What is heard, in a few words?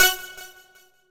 Synth Shot F#; Trap and Dubstep; Synth One Shots; Curser; Ghosthack; Free Trap and Dubstep Samples 2018